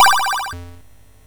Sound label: Turn, Sound Effects; MOS6581R4